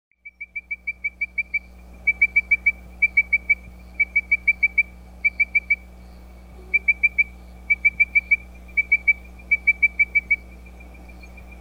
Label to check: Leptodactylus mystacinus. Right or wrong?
Right